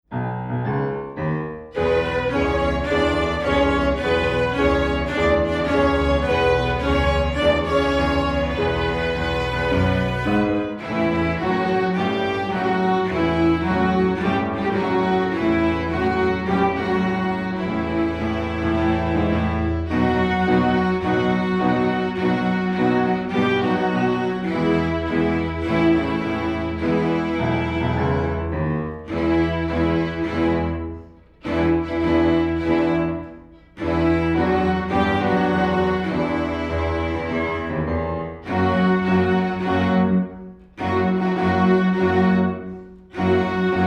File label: Blues Swung